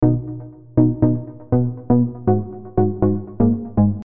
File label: Vibes Midi Deep House